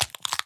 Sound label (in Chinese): 海龟蛋开裂声